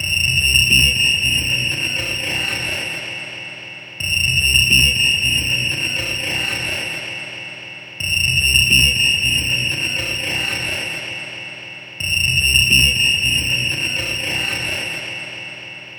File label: Dark Beat, Dark Organic Processing